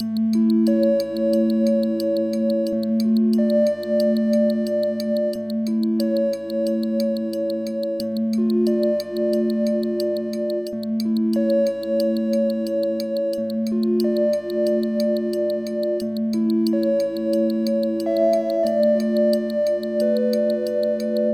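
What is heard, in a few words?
background music event time puzzle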